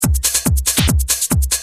cutting, rythm loops

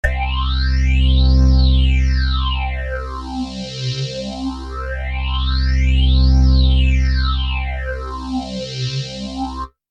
bass C

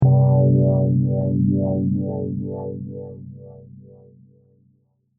303 bass c4

